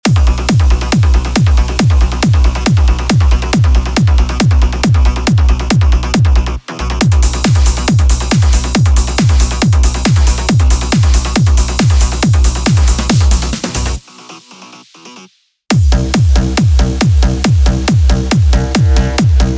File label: Trance Basslines; Steve Dekay; template vol 1